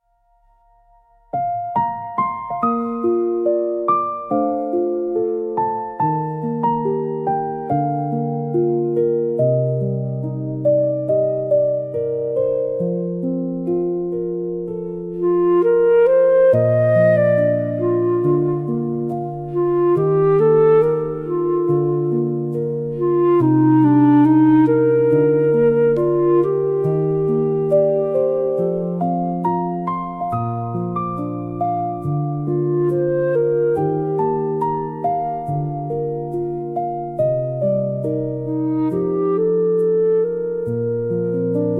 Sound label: Soft Steps in the Garden, fantasy music nature forest; ghibli style; wind healing nostalgia